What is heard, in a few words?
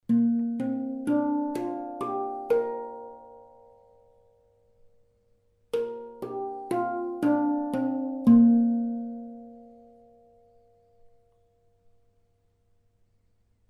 HANDPAN SI Bemol